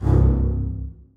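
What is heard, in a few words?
basses staccato c3; Sonatina Symphonic Orchestra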